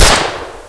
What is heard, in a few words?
gatling fire, weapons